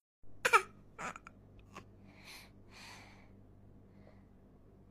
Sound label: Baby yawning